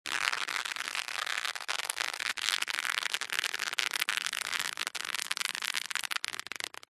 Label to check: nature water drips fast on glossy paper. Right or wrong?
Right